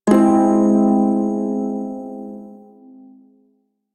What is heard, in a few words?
Shawzin Phrygian Chord; Prime Shawzin; Warframe